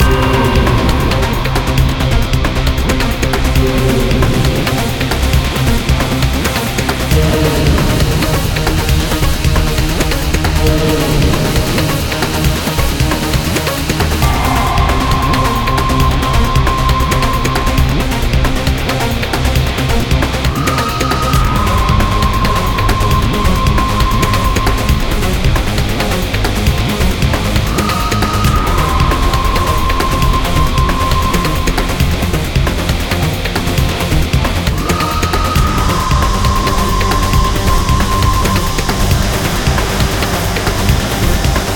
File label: Battle; let it die